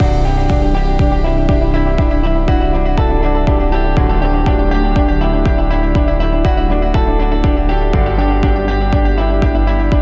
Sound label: Positive Loop Corporate; service business